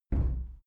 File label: cupboard close soft